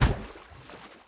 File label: landfill large; Factorio